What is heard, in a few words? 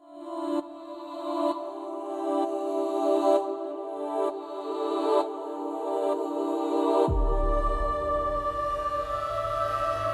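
Voices, Keys, Rhythmic Textures; Mosaic Voices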